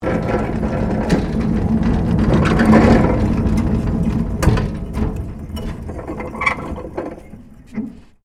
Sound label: DUMPSTER LARGE METAL MOVING SLOWLY, Large metal industrial dumpster; Factory; Factories